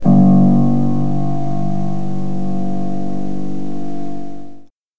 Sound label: Piano